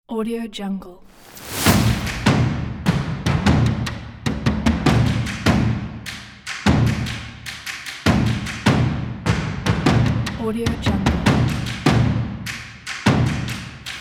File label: armageddon drums